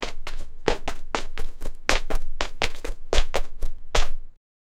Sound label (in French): bruit de pas lors d'une, footsteps